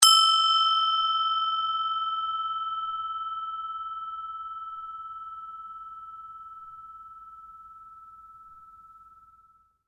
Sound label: Belltree Bell, Small percussion bells; Bells (Sound Effects:Musical)